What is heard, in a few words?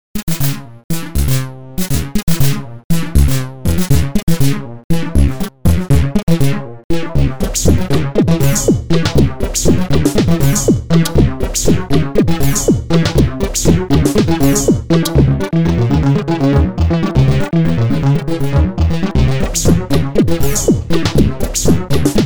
microloop, synthesizers